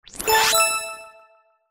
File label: ring win; casino